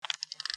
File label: tricorder open